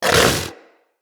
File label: Vocal Tranquillized Grunt; Characters; Hunting Critters; Vulpaphyla